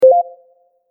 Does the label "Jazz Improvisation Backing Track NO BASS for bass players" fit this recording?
No